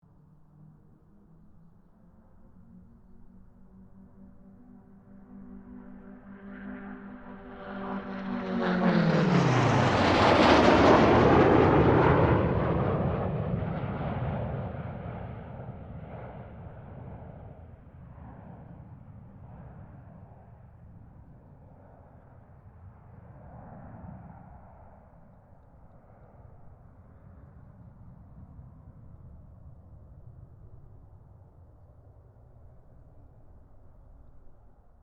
AIRCRAFT GLOSTER METEOR F MK8 PASS STEREO, figter jet; Airplanes; Vehicles